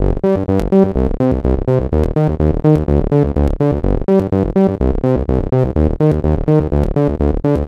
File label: crackle terror 125, synth loop; Zero-G; Soundclash; Nu Dance Electro